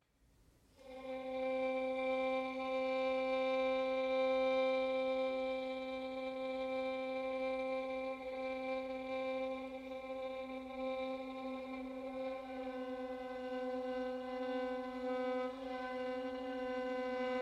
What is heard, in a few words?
string quartet